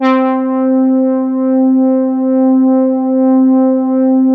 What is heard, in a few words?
MEMORYMOOG; Big Fish Audio - First Call Vintage Keyboards